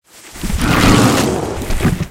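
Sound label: Worm buff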